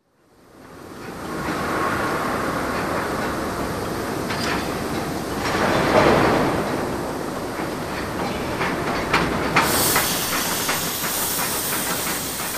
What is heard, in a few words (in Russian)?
staleprokatnij stanok shum para